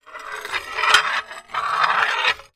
foley glass piece thick broken movement on another; zapsplat